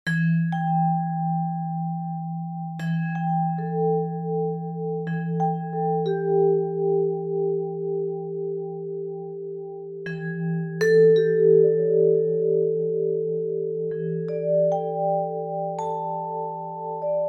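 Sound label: vibraphone adaptive just intonation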